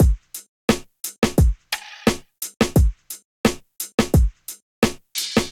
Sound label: Kit, Hip-Hop Drums; Slingshot; Golden Era; Ableton; Sound Oracle